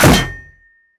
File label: Bullet hit metal; Hovl Studio; SFX Battle sounds; WhiteMan Unity2D